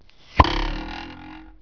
THRW VIB; toon

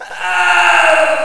arrg

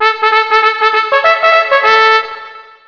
CHARGE

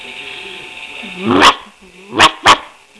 bark

